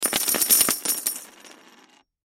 Coins Drop Multiple, Coins Drop Multiple Pirate Gold; Money; Foley; Objects; Blastwave